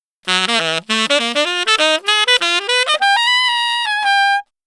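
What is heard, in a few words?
100 Acid Jazz sax G major; Brass; Digidesign; Pro Tools; Pro Tools Essential Loops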